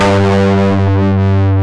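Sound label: distortion guitar G1, GUITAR; AKAI S-Series CD-ROM Sound Library VOL-8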